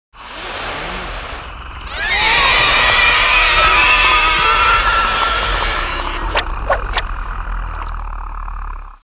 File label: feeder; Babylon 5